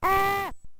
Aah!; lemmings; x68000

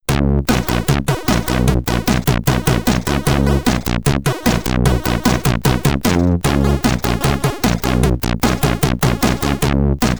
Current Standings, SID Stereo

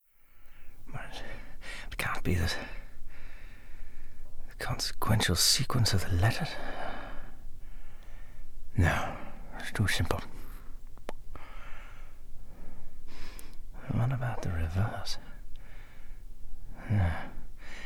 Foley Vocal Work out; cypher; The 39 Steps

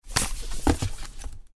Foley Rummage in Cupboard; The 39 Steps

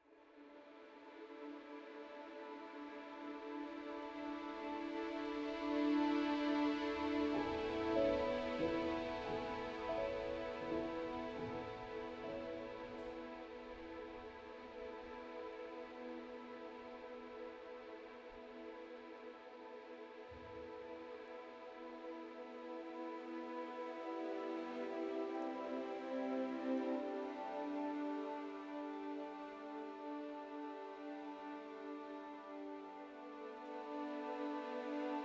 World Music

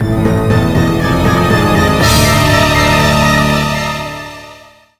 Jingle Game Fail; Monster House; ds

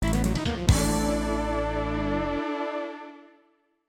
Game Over (SC-55 Version); Asuka 120% Burning Fest.; X68000

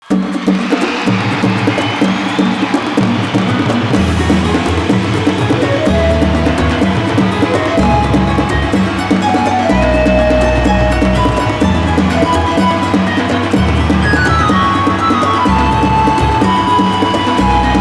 Win Game; sid meier s civilization revolution 2008 nds

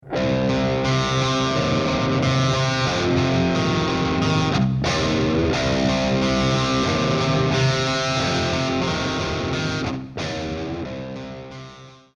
lost round

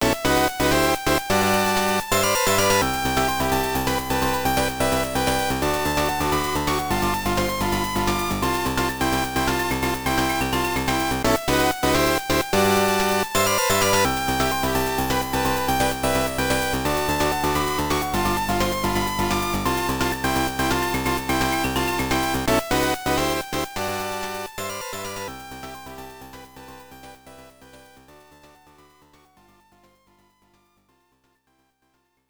Match Won; super volleyball; turbografx 16

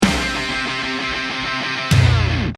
deathcam

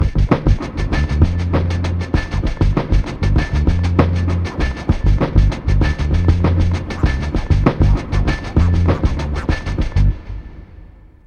Ditty

